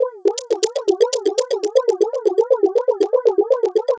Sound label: Structured Rhythm, IDM Rhythms